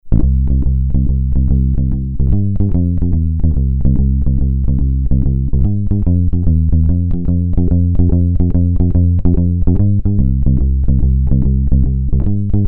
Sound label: Bass Fretless Shuffle Finger